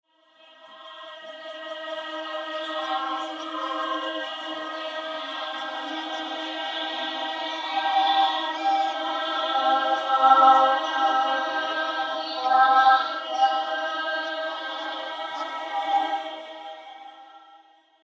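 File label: ambience voices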